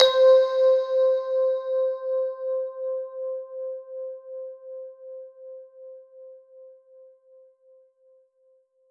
Kurzweil K2000 Tine Elec Piano C5

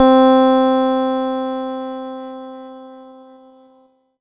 Korg DW 8000 Bell C4

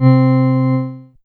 Yamaha PS-55 Pipe Organ C3; Synthesizer - Organs

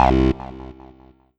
Yamaha MU90R Fuzzline C2

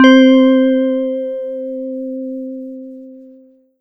Yamaha DX7 Chimin C4

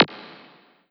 Korg N1R High Q

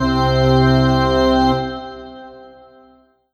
Yamaha MU90R Full Church C2

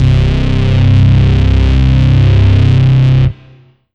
Korg TR Rack Nistrom Bass C1

